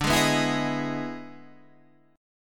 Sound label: E Major 7th D, E/D Major 7th chord